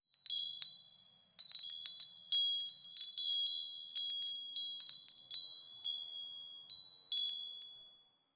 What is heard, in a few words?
Chimes